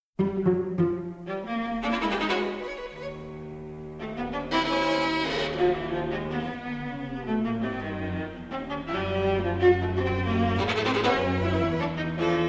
Quartet For Strings Vivace; Quartet No. 6

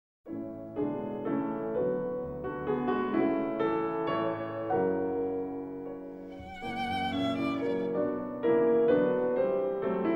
Violin Sonata in A Major, Allegro Amabile; Brahms; Violin Sonata No. 2

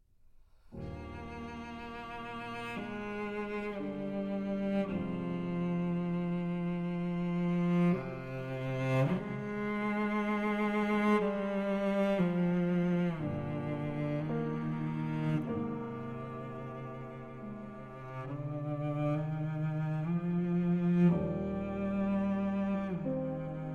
Cello Sonata II. Adagio - Presto

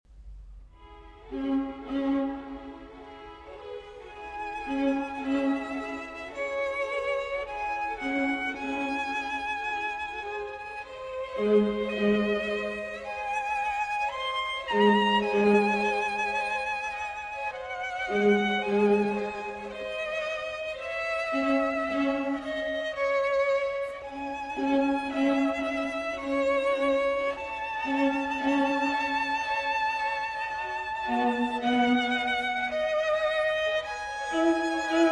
Violin Concerto in E Major; Op. 8, No. 1, RV 269 La Primavera

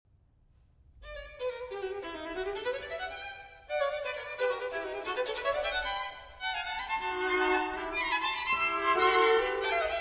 String Quartet, Presto (Live)

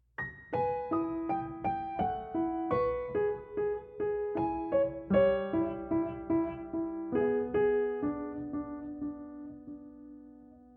Improvisation

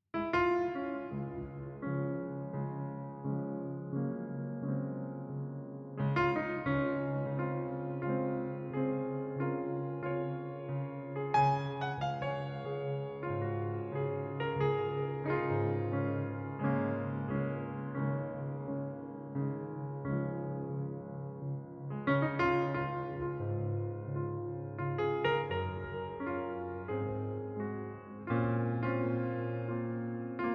Jazz Lounge - Romantic Piano

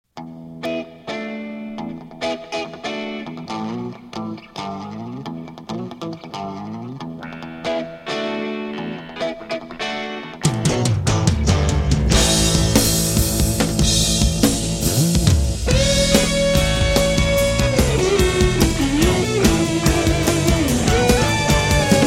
Instrumental

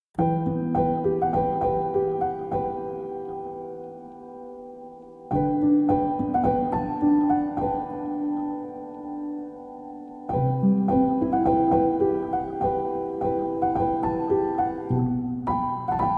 (Arr. For Piano)

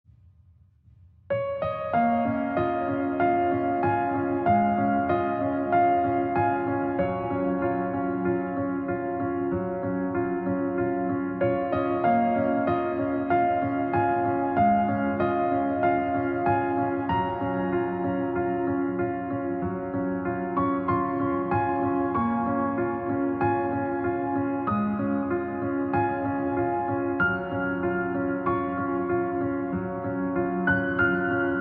Piano

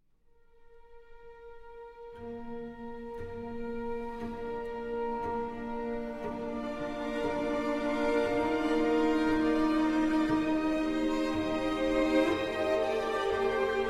Solo Violin and Strings Version

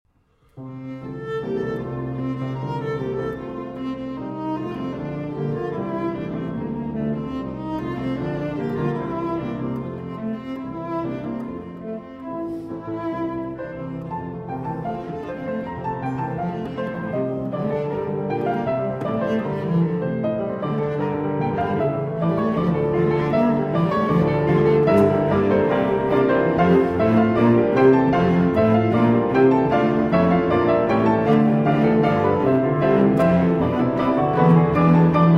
Cello Sonata, Allegro molto; No. 2 in F Major, Op. 99 IV.

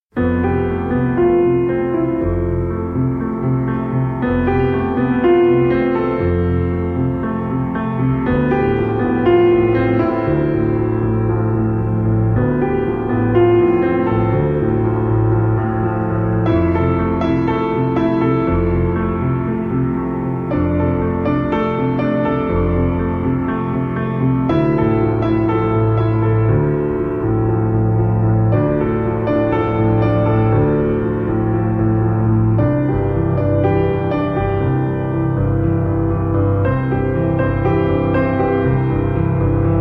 Solo Piano

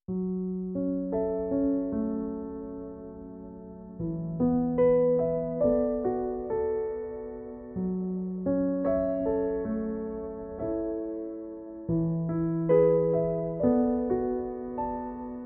(Arr. For Piano)

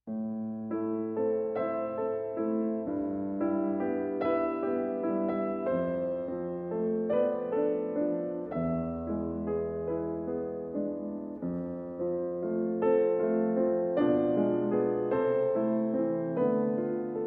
Andante & Allegro For Violin & Piano

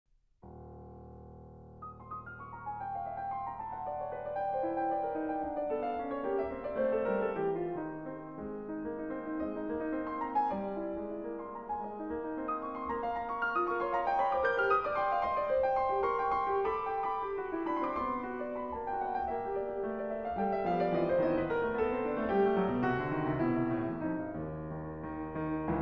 Piano Concerto No. 1 in C Minor; Op. 35; III. Moderato (Attacca)

